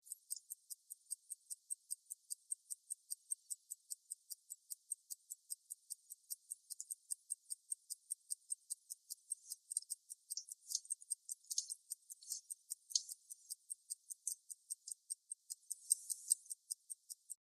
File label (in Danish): armbandsur